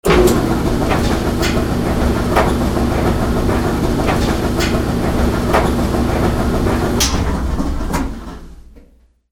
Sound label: HOUSE